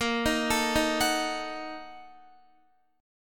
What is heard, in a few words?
A sharp Major 7th Suspended 4th Sharp 5th, Guitar; arpeggiated; 4F,4A,5C,5G,5C,6E; JGuitar